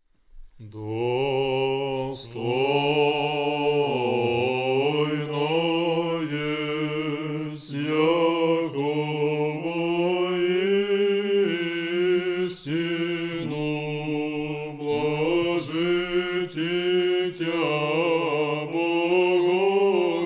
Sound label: it is truly meet tone 3